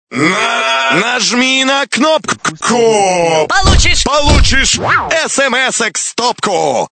Sms

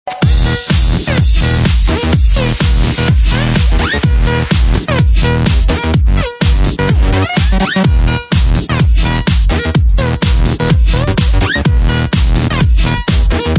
electro; клубняк.

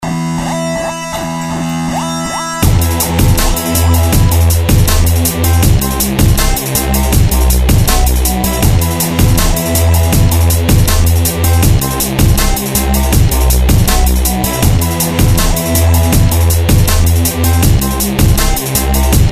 dnb; нарезка Dram And Bass

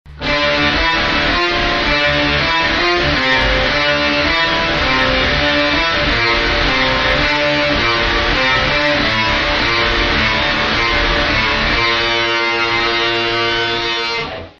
Oktaver

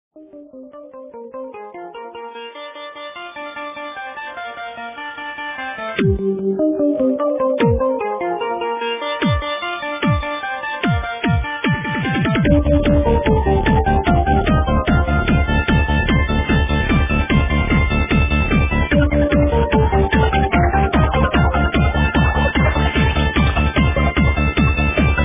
TRANCE

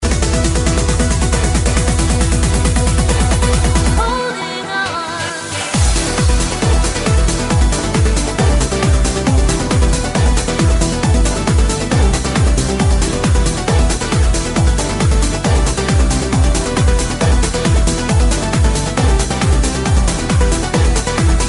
Future Trance

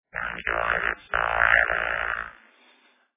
Robot

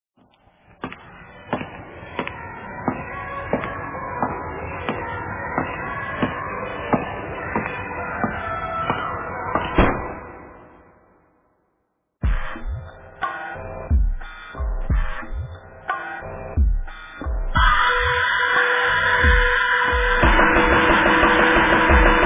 Rain Remix

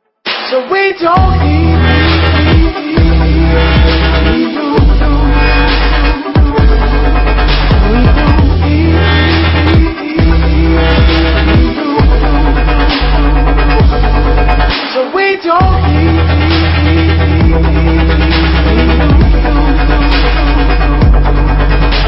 Dubstep

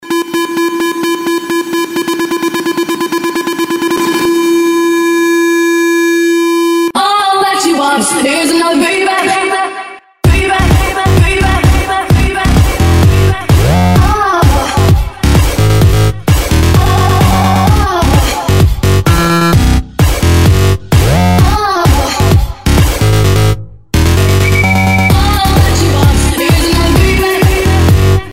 music elec

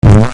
fart

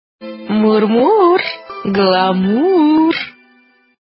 SMS Mur mur; Мур, мур, мур-гламур.

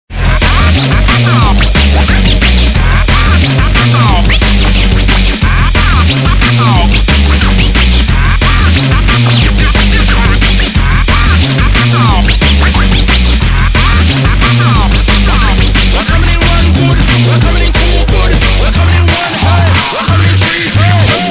Drum n Bass

